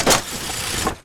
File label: mechanism; Minecraft; Conquest Default